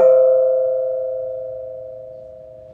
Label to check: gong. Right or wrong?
Right